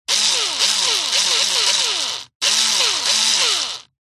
industrial power tool electric screw driver bursts; zapsplat